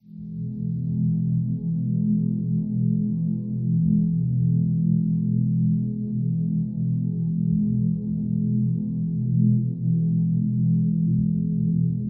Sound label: binaural delta waves